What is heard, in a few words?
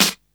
SD HOU, Dance Mega Drums; Best Service